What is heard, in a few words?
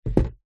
foley cardboard box fast food empty set down table